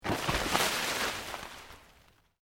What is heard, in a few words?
PLANT LEAVES LARGE MOVE FAST, rustling; Material components for constructing more complex sounds